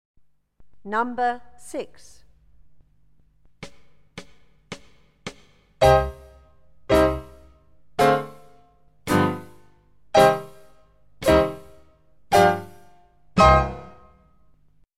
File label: primary tap taps stamps